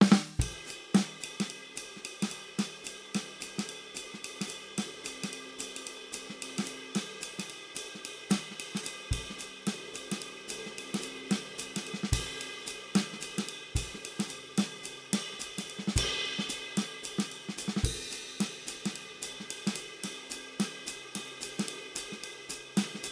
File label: Modal Jazz Drum; MusicDelta; MDBDrumsPlusPlus